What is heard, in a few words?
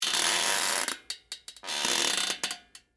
Squeak Metal Springs, Bicycle, Seat; Texture